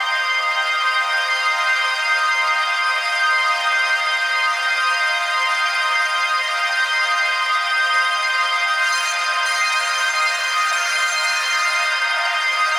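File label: pad loop sparkling 150 F minor; Virtual Riot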